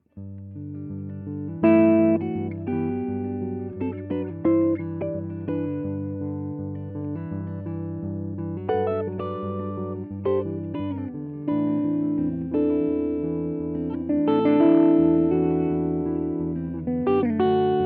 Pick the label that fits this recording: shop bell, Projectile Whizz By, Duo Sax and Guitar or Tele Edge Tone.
Tele Edge Tone